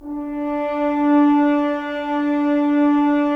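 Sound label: Solo French Horn, Brass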